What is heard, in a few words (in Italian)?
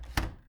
Porta close; Phasmophobia